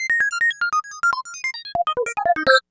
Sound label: Trickle Down, Keyboards